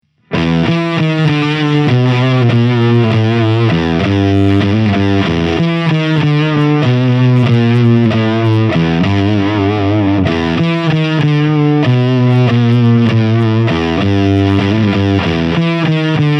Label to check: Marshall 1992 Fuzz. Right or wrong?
Right